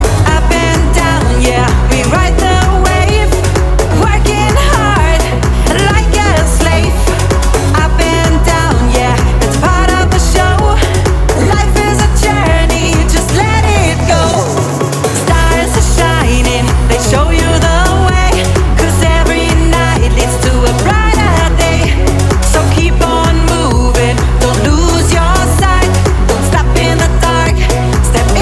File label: Punchy Pop Version